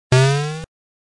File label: big jump correct; Barrel-Jump